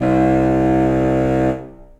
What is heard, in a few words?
Contra Bassoon Solo; Ableton; Orchestral Woodwinds